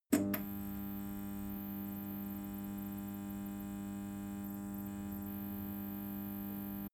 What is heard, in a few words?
fluorescent lamp fast